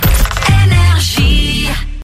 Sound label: Jingle; HYPER ID; NRJ; RAPID 2023